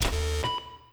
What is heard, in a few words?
Mode Switch